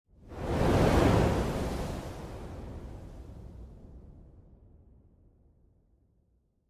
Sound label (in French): SOUFFLE ONDE DE CHOC Bruitage